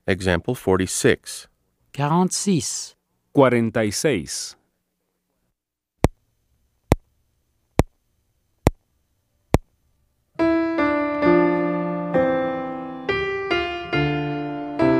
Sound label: Keyboard